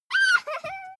child laugh high short

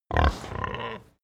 pig grunt close

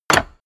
medieval click medium neutral

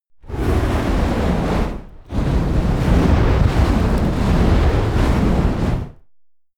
flamethrower medium heavy multi

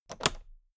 toolbox latch close regular

